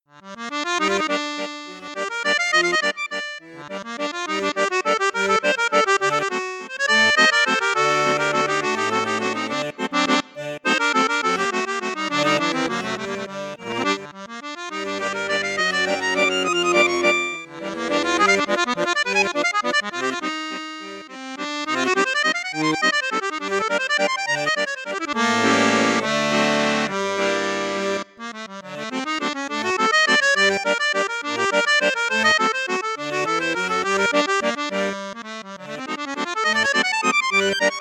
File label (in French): registre flute, maugein resonance accordeon; maugein resonance iv